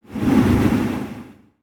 teleport

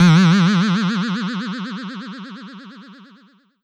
boing

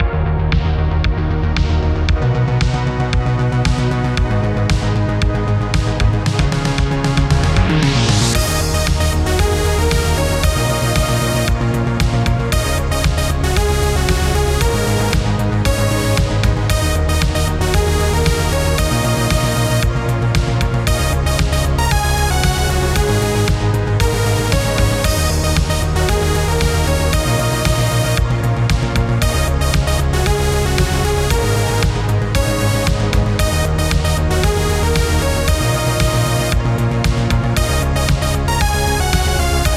space city bpm115

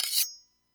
machete vs spatula

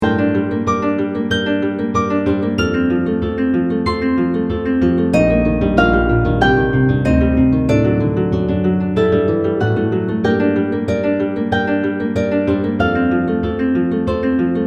harp only

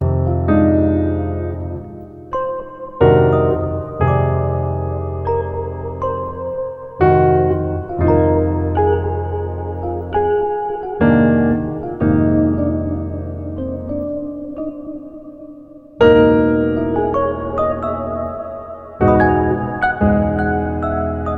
jazz improvisation

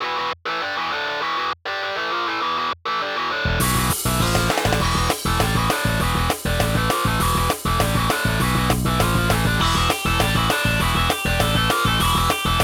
In Game

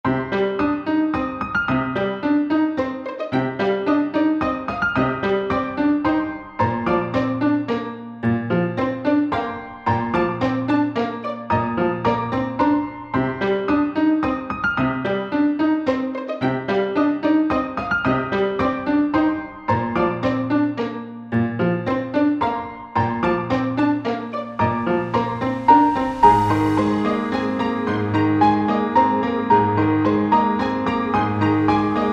Amber Forest piano

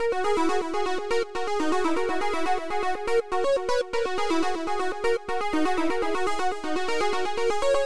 SWINGING SEQUENCE, electronic music; Swinging Through Trees

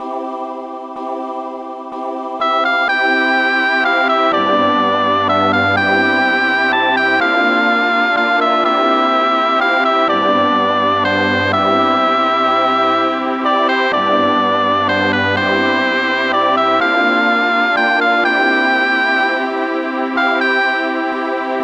hope jingle

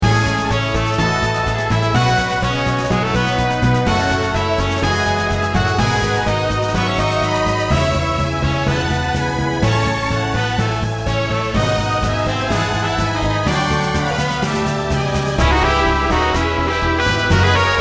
wonderful transportation retro system orchestra

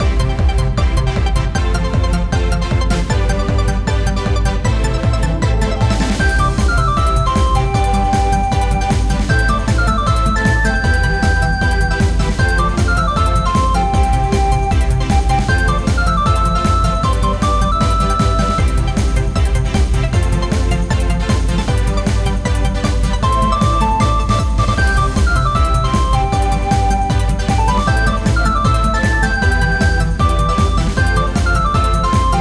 blossom mountain climax remake bpm155; Blossom Mountain(+Remeke)

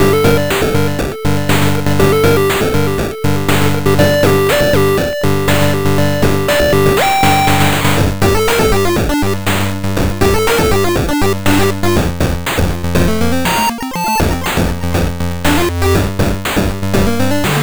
Beat'em Up Level

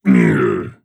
Cow Hurt

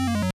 fail; Chiptune SFX Pack

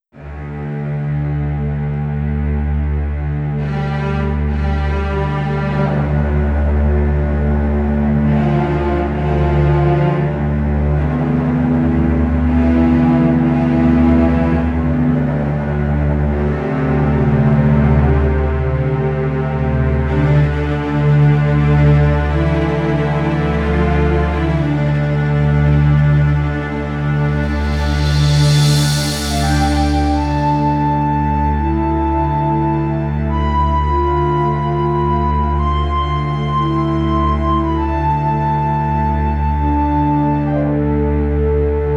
the flute of rock jaw

